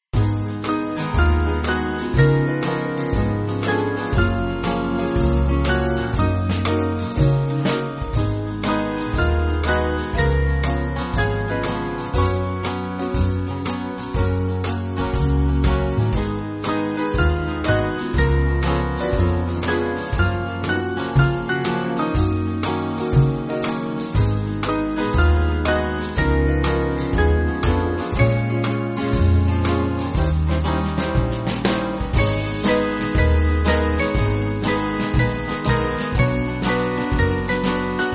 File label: Band